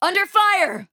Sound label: Taking Damage; Paladins; Kinessa